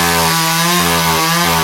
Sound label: saw hit; weapons; quake2